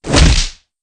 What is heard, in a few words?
hit hard; rocco